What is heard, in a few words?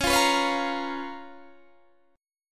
Db 13th Db; strummed; 21,20,21,18,18,18